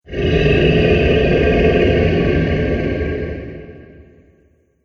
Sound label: Dinosaur Creature; animal; Dinosaur Sound Effects